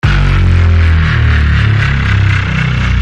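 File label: power down; PwDn Brain Freeze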